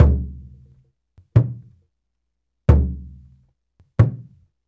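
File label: 90 bpm, Nyabinghi; Spectrasonics; Supreme Beats; African Contemporary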